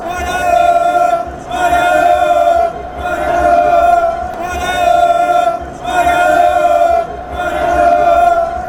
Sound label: paraguay chant